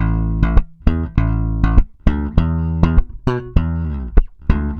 BAI BS AM, BASS BAION

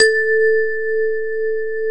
FM BASS; AKAI S-Series CD-ROM Sound Library VOL-7

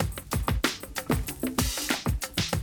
Classic DrumLoops

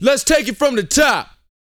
VOX F, Vocal Planet R&B & Dance HIP-HOP; ILIO

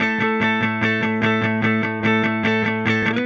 Silos Clean Strum Guitar, Indie Rock

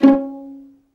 violin D#; ILIO; Synclavier Strings

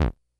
Bleep B, Drum Machines, Korg DS-10

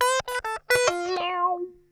SQUEEZER, Funk Guitar; 90 s Sample CDs; Zero G

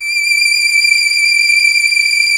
D6 hi, lush strings; InVision Interactive; Lightware VOL-1; Instruments & Percussions

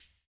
CLP, CLAP; ILIO - Ethno Techno

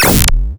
Cork Board Snare; Cwejman S1; DevSnd Cwejman S1 - JK

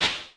CLAP, Vermona Mono15 Drums

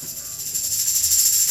PERC, Dance Mega Drums; Best Service; PERC-MIX

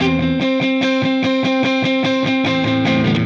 Silos Dirty Strum Guitar, Indie Rock; Sonic Foundry (Sony Creative Software); Indie Rock Anthems; Session 11 - Silos

